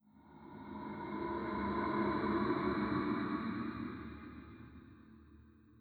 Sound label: monster